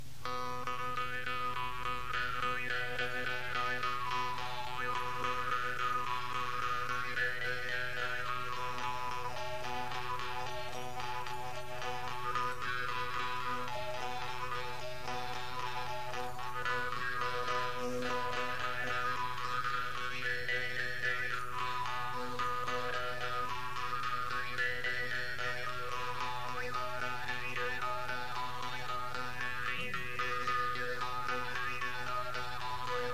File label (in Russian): vargan; etno